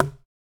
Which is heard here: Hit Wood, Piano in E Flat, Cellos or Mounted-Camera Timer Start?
Hit Wood